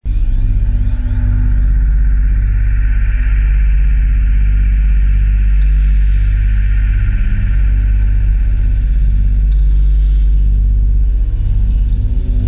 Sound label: scanner public phono